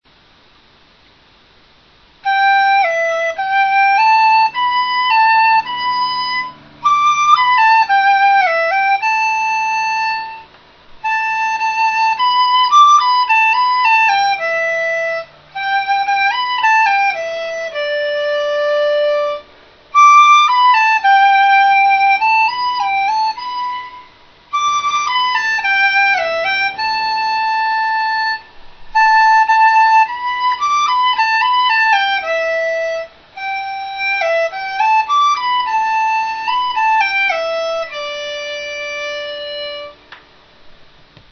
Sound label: dizi